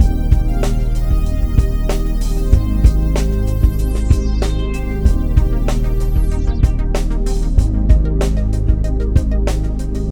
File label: melody; Amatic; Lucky Zodiac